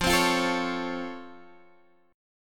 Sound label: C sharp Augmented Major 7th F, guitar chord